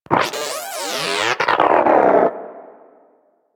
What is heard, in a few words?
Moa Inquisitive Vocal Death; Warframe